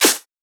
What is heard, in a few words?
clap snare; Anthemic